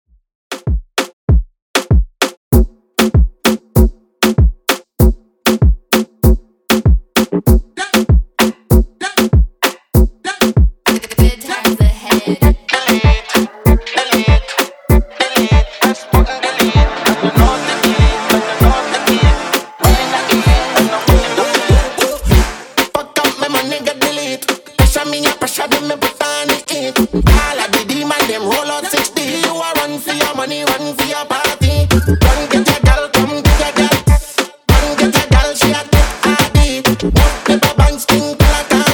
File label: Moombahton 97bpm